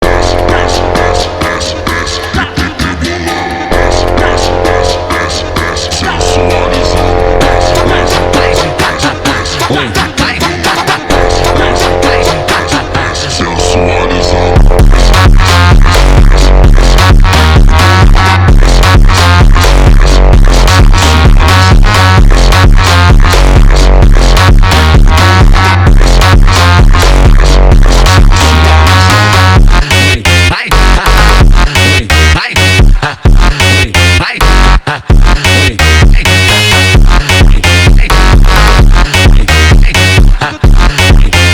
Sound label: Brazilian Phonk